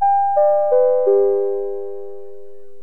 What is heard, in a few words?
4 tone chime down